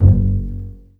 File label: G0 PIZ F BAS, string; East West; The Ultimate String Collection